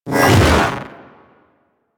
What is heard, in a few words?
Hoverboard Jump Spin Fast; Warframe; Vehicles; Venus; anim sounds